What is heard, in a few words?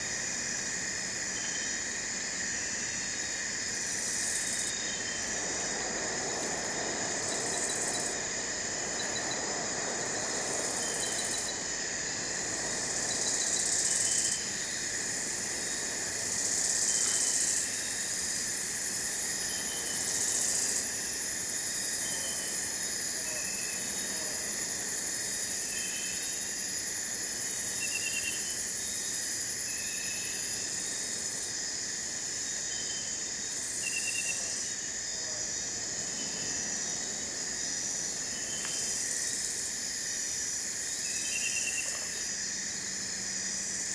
ambience jungle bg lp 2ch; smite; conquest